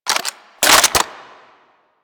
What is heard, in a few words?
Reload, Weapons; Supra